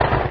truck shift down

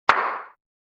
clap

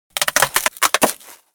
styrofoam break

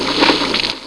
SHLURP

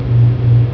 CLAW RISE

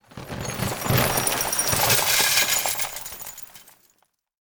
nails drop box

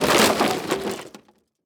wood debris

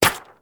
bullet hits paper

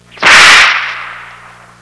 WHIP

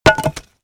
wood board drop

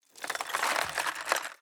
metal pieces drop box

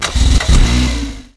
engine moped start

